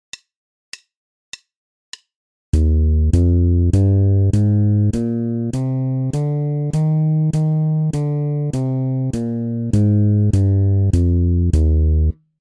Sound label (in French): Gamme majeure de Mib, basse; exercices